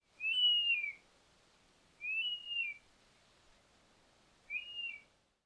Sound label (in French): bondrée apivore